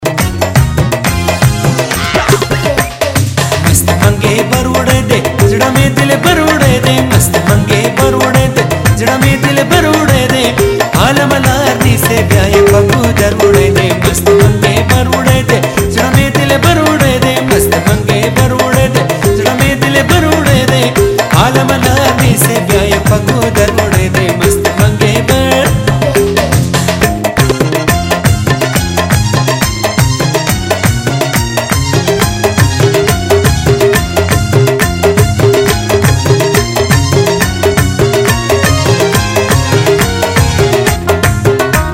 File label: Pashto